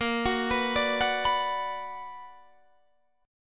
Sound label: B Minor Bb; Bm/Bb; arpeggiated